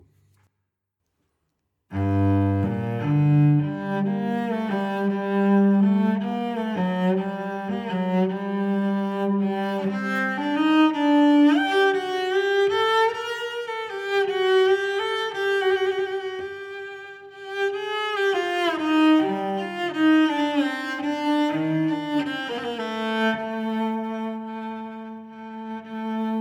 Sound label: Cello